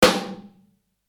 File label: rimshot muffled